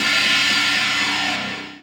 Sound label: D+B